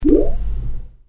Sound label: Bubble